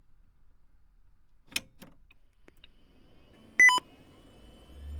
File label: nec pc9821v12